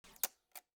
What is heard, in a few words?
Windows Navigation Start